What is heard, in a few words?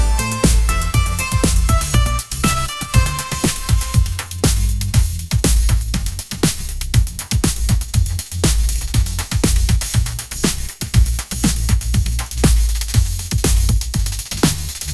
river lights pop groove